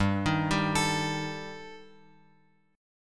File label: G Suspended 2nd Sharp 5th; Gsus2#5 Chord {3 6 5 X X 5} arpeggiated